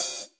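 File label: hi-hat, Drums; Bob Clearmountain Drums I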